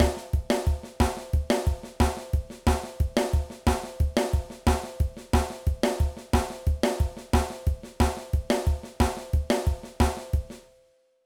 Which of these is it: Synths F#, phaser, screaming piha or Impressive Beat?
Impressive Beat